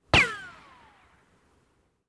ricochet reverberant

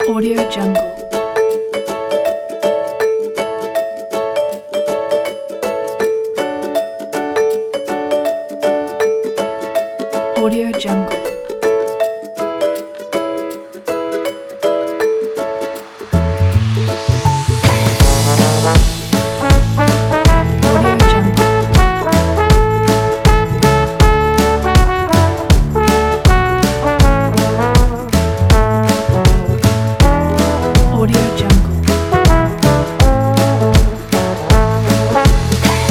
upbeat ukulele happy kids

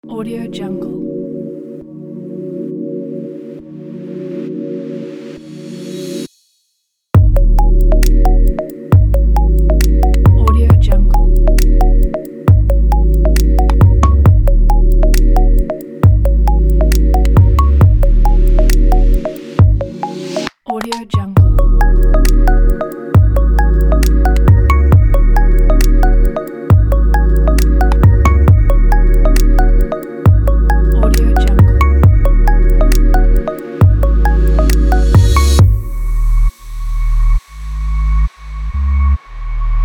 minimal technology

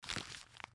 recycle scratch

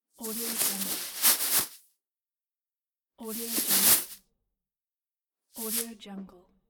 glove on

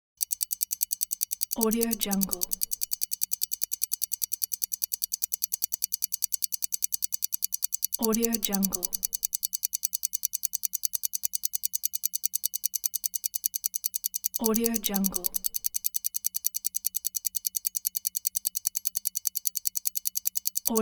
stopwatch

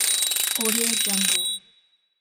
key mechanism rotating

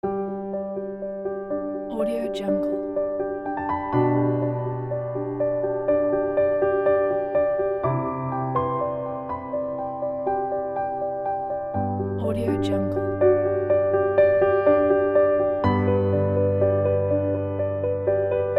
happy time solo piano

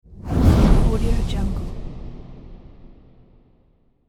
fire whoosh

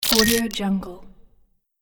technical droid interface

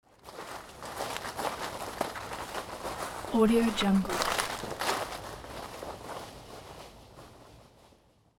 group walking on leaves outdoor

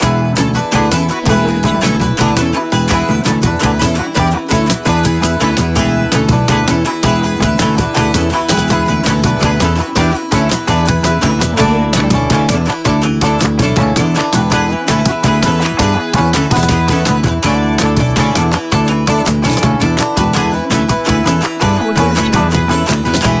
upbeat travel folk

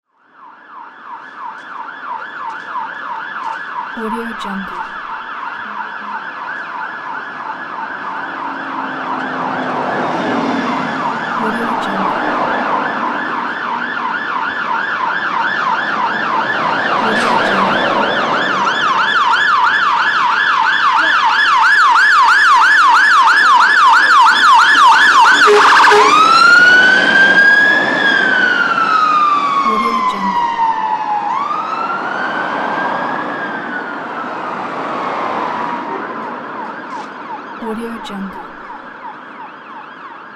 fire engine pass by

